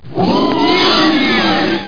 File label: growl